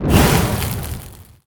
Death Underlay; Flamecaller

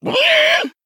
Male Quilboar Death

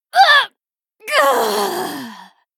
female night elf, Death

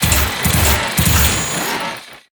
Mechanohorse Attack Underlay

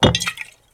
Friendly Bartender Underlay Play

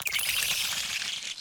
Infested Goblin Attack

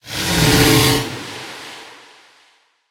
Hot Spring Guardian, Death

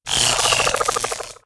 Shock Hopper Death

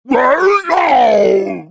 Party Animal Death

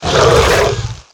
Scaleworm Attack

